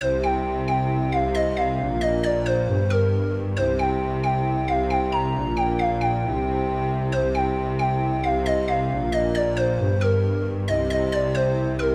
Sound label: forest